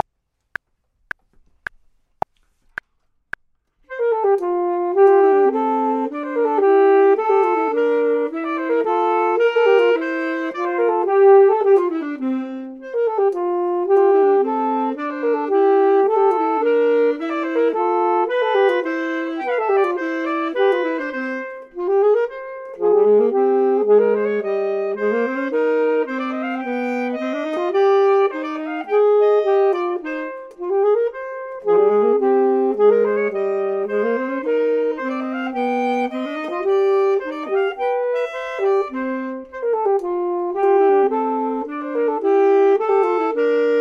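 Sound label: both, Saxophone and Clarinet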